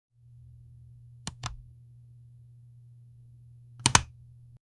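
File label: digital button sound, button press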